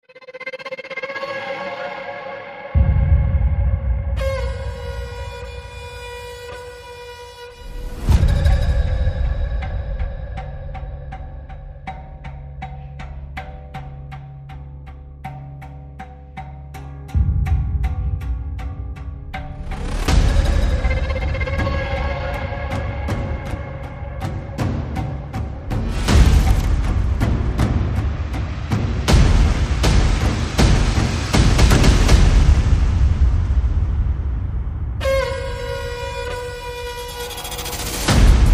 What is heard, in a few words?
Tribal